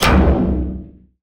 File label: Steel Traps, Industrial Hits; Antidote Advent